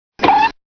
bing; MOBICLIC 69

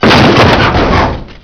big

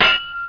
block

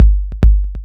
drum; 140 BPM

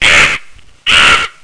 Parrot; GEOGRAFIA

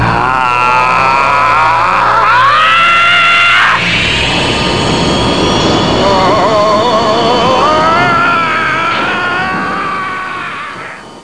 aah

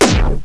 black Hole; Ricochet 2.0

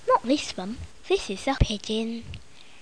dove; Little Red Riding Hood

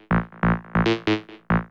Bass; Techno Music Maker

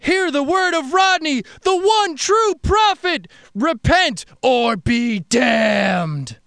worship

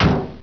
hit chair

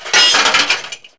metal break; KINGPIN

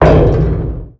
drm, impact metal

